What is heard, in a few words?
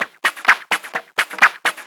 FX Loop 128 BPM, House